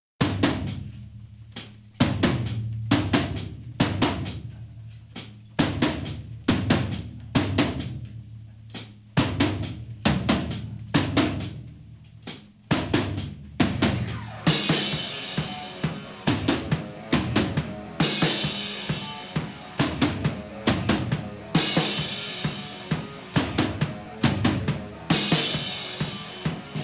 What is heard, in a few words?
drums